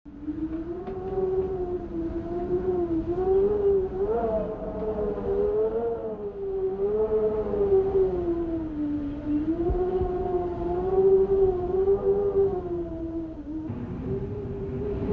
viento fuerte

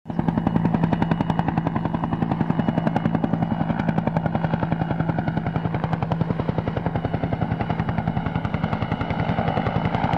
elicoptero

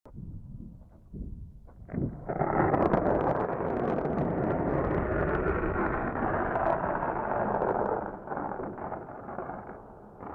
truenos

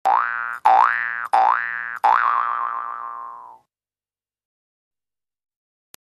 muchos muelles

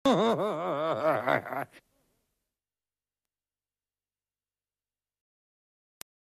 risa mala

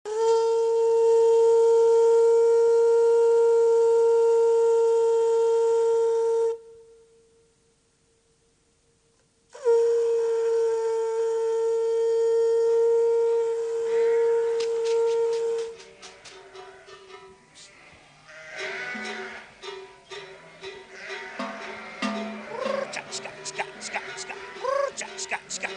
llamando a ovejas

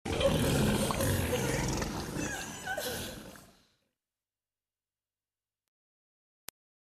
gente llorando

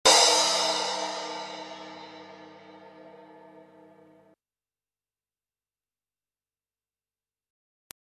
platillo

